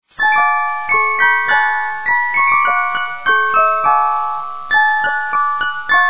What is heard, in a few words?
bluebells; Reuge